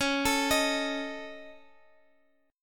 Db Suspended 2nd Sharp 5th, Guitar, Open F; arpeggiated; x,20,21,22,x,x; Dbsus2#5

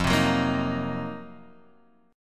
C Minor 7th Flat 5th F, chord; Cm7b5/F strummed; JGuitar

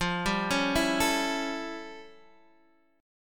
A 7th F, chord; A7/F; arpeggiated; JGuitar

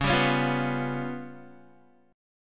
Db 7th Flat 5th, Guitar; Db7b5 strummed; JGuitar

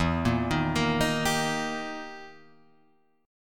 E Minor Major 7th Flat 5th, Guitar, Drop D; chord shape

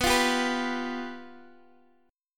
F Suspended 2nd Flat 5th, B

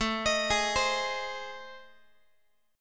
A Minor Major 7th Flat 5th A, Guitar; AmM7b5 arpeggiated; x,17,18,18,16,x; 4A,4E,5A,5D,5G#,6C#

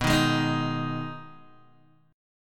B 6th B down Guitar Open G

